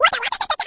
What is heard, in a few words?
burn